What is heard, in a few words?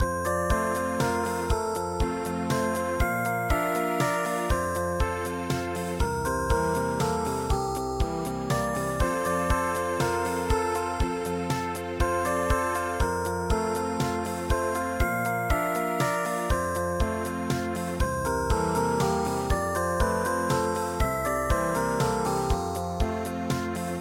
waltz ballade; midi; traditional